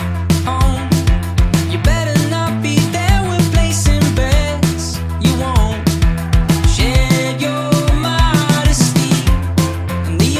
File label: ringtone english ringtones